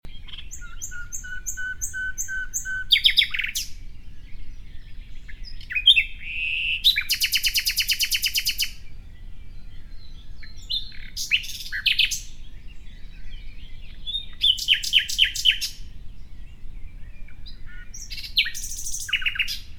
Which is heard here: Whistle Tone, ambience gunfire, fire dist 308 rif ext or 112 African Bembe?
Whistle Tone